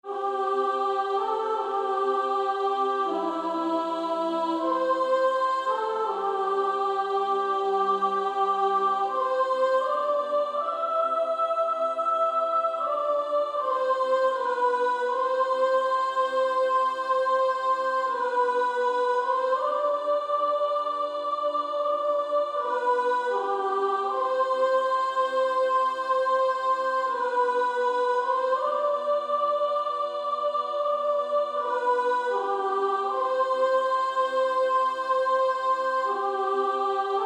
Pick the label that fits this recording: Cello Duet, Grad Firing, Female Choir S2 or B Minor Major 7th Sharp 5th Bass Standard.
Female Choir S2